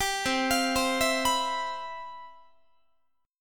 C Major 11th C, Guitar 4A,4E,5A,5D,5G sharp,6C sharp; CM11 arpeggiated